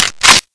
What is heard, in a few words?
elite clip in; weapons